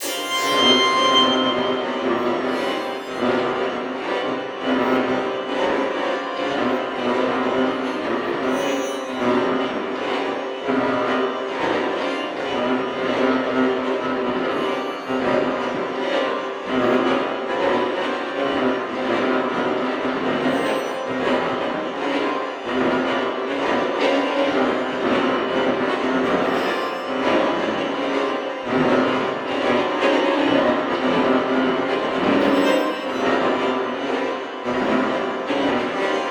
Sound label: Strings; Xenakis